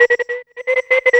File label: SYNT; USB Soundscan vol.47; AKAI; Hip-Hop & R&B